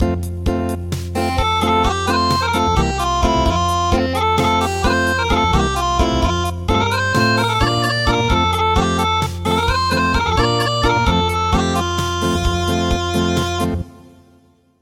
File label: Acoustic, traditional; The Riera Heir